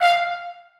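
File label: trombones staccato e2; Sonatina Symphonic Orchestra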